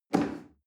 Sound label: chair wood take hard